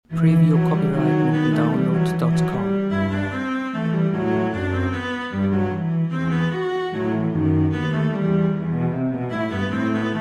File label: CELLO